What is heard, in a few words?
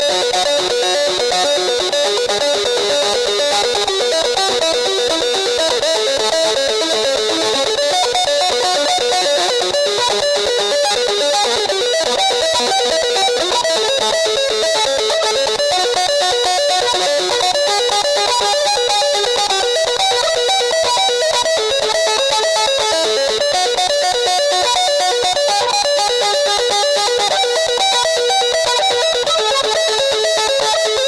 Guitar